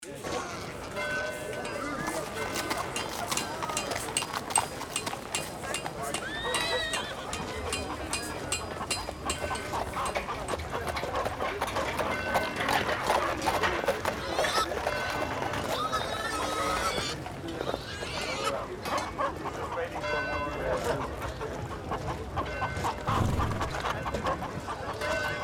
Medieval city ambience busy metal clanking horses and people